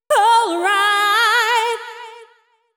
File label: deep house female, 125, A, vocals